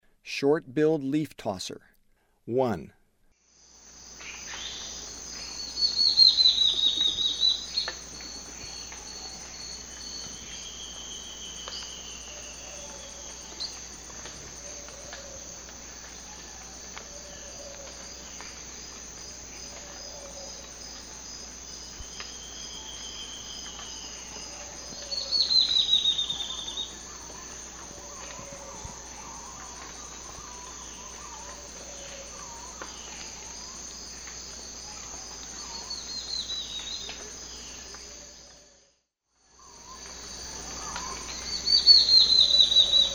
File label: Short-billed Leaftosser; S. America; Eastern Lowlands